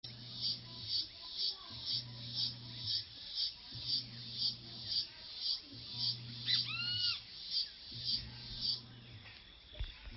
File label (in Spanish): chicharra, insectos